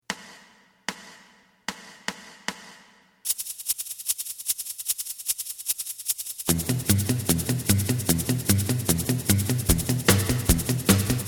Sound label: bass